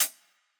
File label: hihat; japanese pop